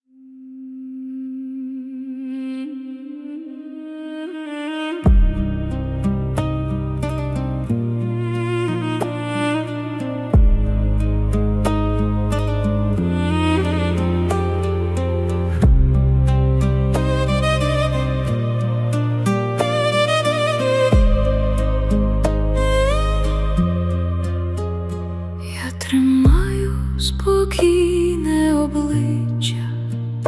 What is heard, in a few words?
ukrainian folk remix